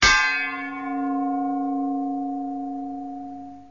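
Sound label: String Echo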